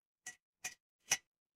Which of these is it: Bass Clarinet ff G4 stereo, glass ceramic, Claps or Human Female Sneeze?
glass ceramic